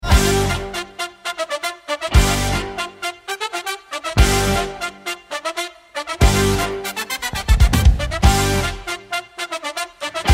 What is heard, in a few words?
Horns; musical theater